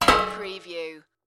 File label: Metal Impact - Drop Metal Pipe On Metal; lynne-publishing